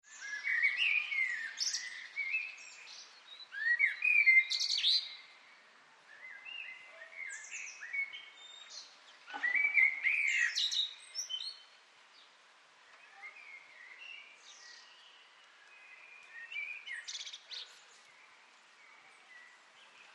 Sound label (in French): bird merle noir